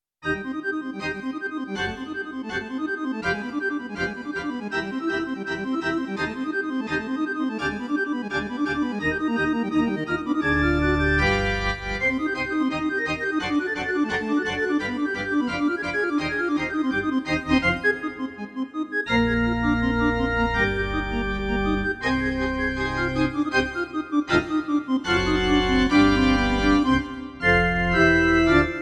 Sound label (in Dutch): Kerkorgel, Roland FR-8X V-Accordion; church organs; Roland FR-Serie